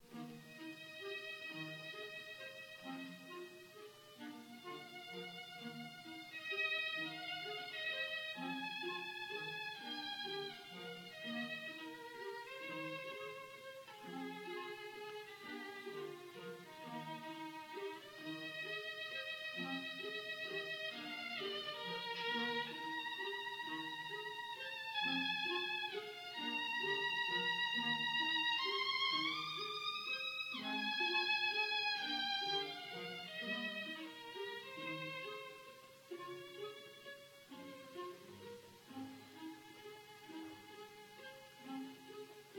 Violin Concerto, Andante assai, Violin, LIVE RECORDINGS; PROKOFIEV